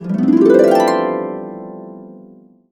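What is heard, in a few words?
C major, Harps; USB Soundscan vol.62; AKAI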